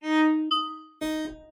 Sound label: 3 tones